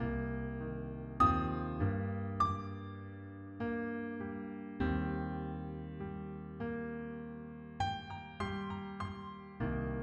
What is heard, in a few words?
Piano Loop 100BPM Gmin, classic; 99Sounds; Monosounds; Music Loops; Instrument Loops; Pianos